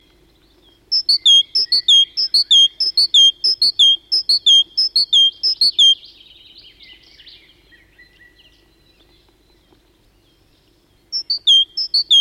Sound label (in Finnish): talitiainen; kevat; Luontovuosi